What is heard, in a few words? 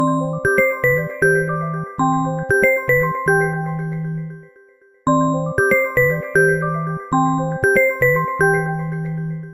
galaxy bells samsung galaxy s25